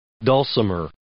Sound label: dulcimer